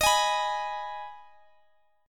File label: D sharp Suspended 4th Sharp 5th D sharp, Guitar Standard; strummed; chord; x,x,x,20,21,19; D#sus4#5 Chord; JGuitar